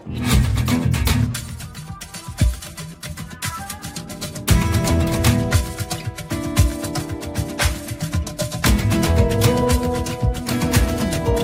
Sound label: ringtone